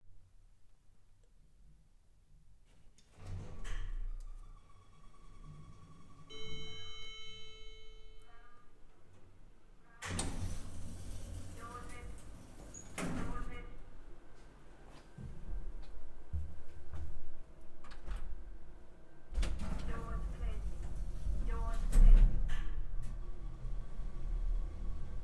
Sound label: London Lift